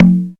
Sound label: conga hi; Korg; DDD-5